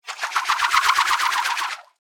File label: Grapple Gun Rope, Grapple, Gun, Rope, Spool, Fast, Device; Warfare; Miscellaneous Combat; BlastWave